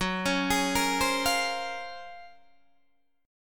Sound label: Gb Major Sharp 11th, Guitar DADGAD; GbM#11 arpeggiated